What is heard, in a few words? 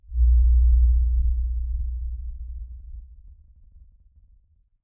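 Explosion Rumble Exterior Distant low frequency effects; Boomtown; Titanfall 2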